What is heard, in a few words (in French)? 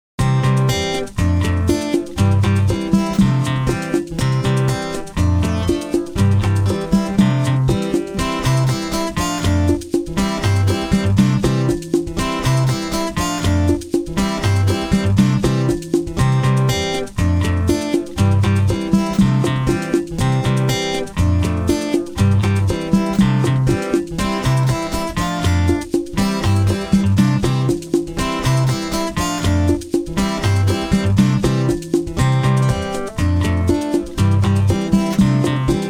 accompagnement seul